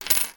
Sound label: money coins x3 australian down; zapsplat